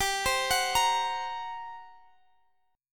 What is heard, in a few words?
G Minor Major 7th Double Flat 5th, G, down, Guitar, Standard; arpeggiated; JGuitar